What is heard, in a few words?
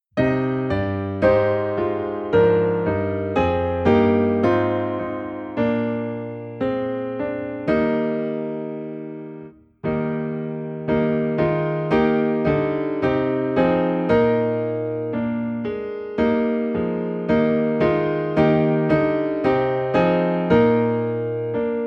Piano in E Flat